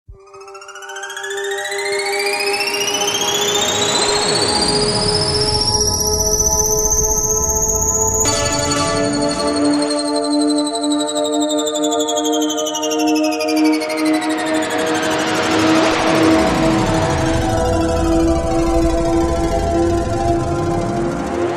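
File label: sound of flight in a mortar with witchcraft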